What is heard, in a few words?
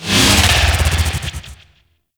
Sentient Vessel Movement; Warframe; Tau